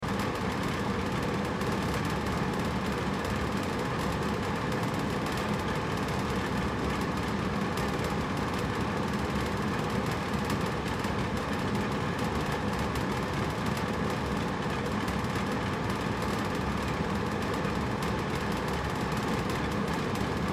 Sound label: Air Conditioner Window Mount Hum Rattle Loop Room Tone; Ambience; Blastwave